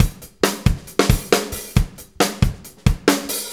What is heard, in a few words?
Drum Tools, Loops; Sonic Foundry (Sony Creative Software); Various Loops